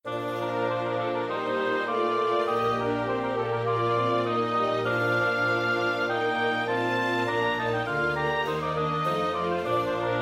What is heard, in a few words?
orchestration partita major; Johann Sebastian Bach; s bach bwv